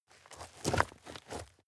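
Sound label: step dirt heavy boots scuff hard, footsteps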